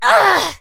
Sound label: hurt vo